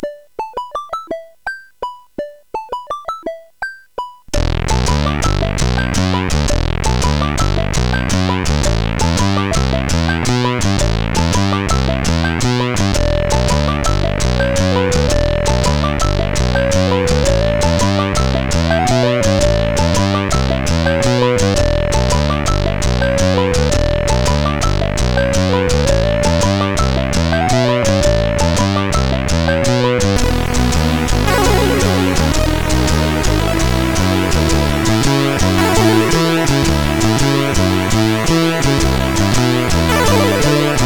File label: sid CSG8580; CSG8580R5; Elf Caper